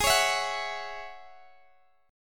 G sharp 7th Suspended 4th Sharp 5th, Guitar, DADGAD; x,x,18,18,19,16; down; chord shape